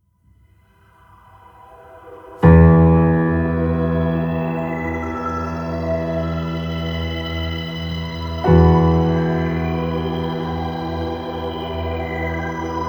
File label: piano and ambience